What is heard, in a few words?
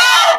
claw scrape; npc; hit; Left 4 Dead 2; Source Engine; infected